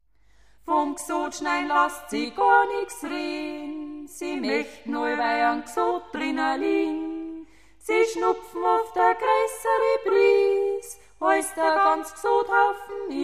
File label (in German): Drum Bauernliad